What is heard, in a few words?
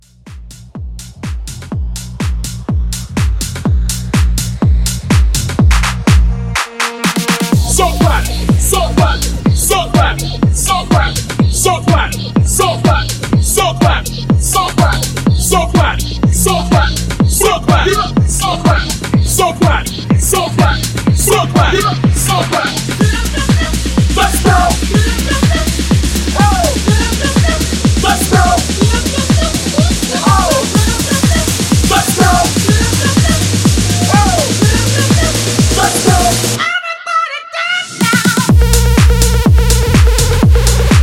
House, 124; Mashup